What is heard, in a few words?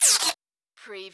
Servo Panel Sound - Servo